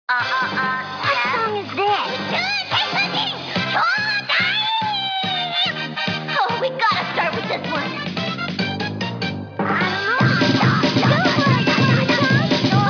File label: karaoke; micspam